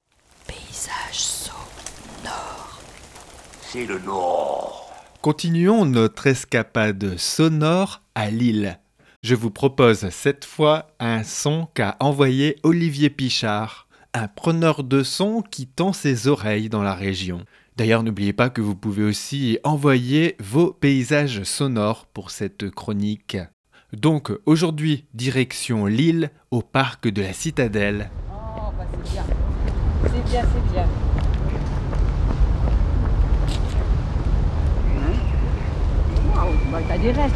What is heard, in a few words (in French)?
LILLE Parc de la Citadelle; Hauts de France; PAYSAGE SO NORD; Olivier Pichard